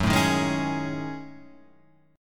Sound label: Gb Minor 6th Add 9th Gb down Guitar Open F; Gbm6add9; strummed